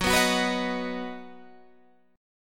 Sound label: Eb Minor Sharp 5th, Gb